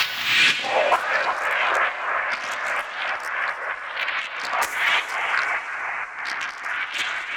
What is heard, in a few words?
fxbed loop